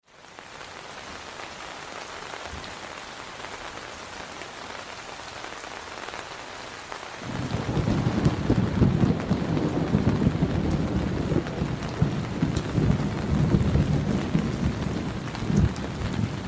Thunderstorm heavy rain on umbrella

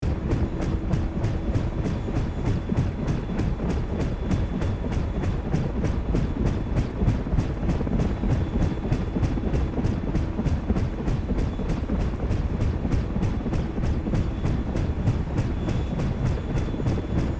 Helicopter slow motion loop

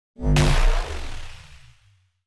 Lightsaber sword impact; Sci-Fi; Energy

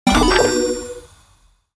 Chest open reward

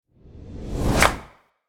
Cinematic rise and clap

